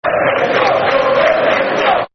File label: We All Hate Leeds Scum, Football Chants; Derby County Dcfc